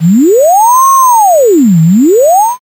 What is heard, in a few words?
police siren